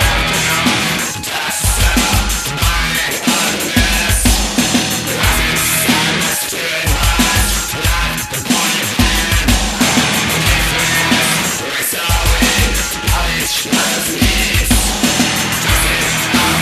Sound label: Industrial music